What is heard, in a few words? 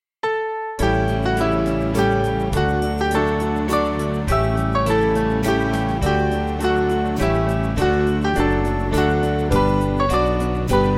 I Sing The Mighty Power, Band; Small Band; Varina